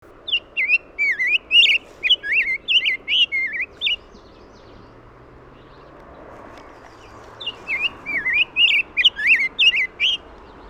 Rose breasted Grosbeak Pheucticus ludovicianus

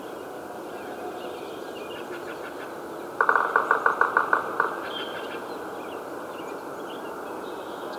Red-breasted Sapsucker Sphyrapicus ruber

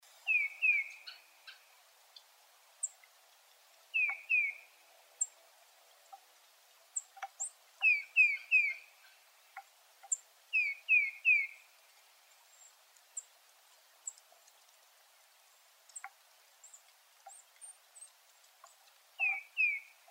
Tufted Titmouse Baeolophus bicolor

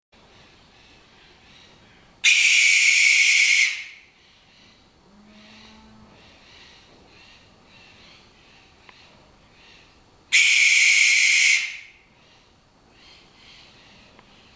American Barn Owl Tyto furcata tuidara

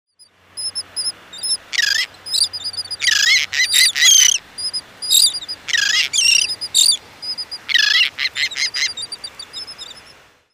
Royal Tern Thalasseus maximus

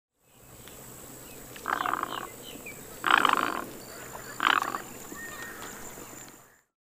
Great Egret Ardea alba

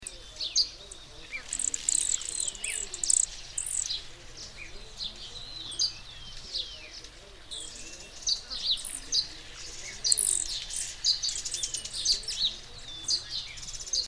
Chilean Woodstar Eulidia yarrellii

